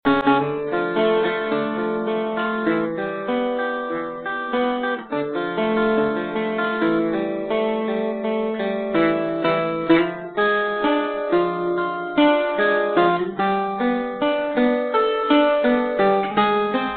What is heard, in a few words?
Improvisation